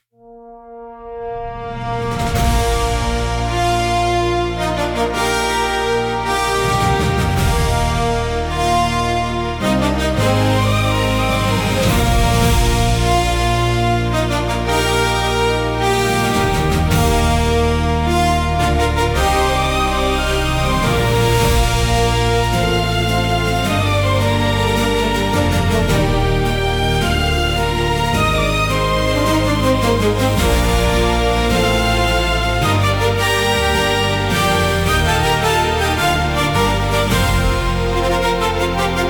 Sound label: fanfare; starwars